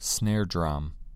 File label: snare drum